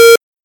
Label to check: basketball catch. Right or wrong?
Wrong